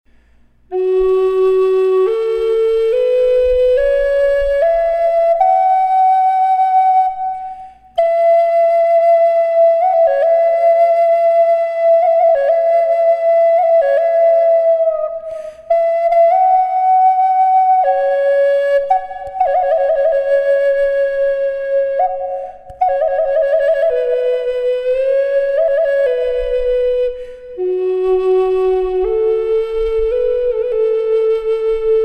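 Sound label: reverb, flute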